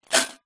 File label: foley money collection tin coins inside shake single; zapsplat